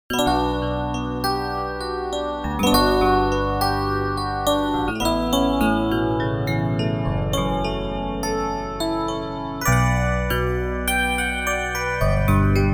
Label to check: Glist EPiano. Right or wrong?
Right